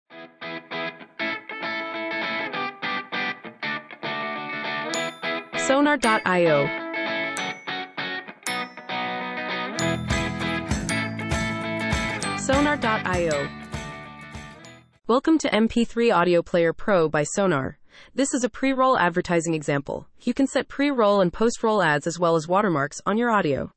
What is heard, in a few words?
Fun Advert